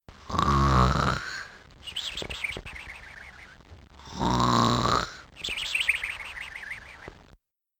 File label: snoring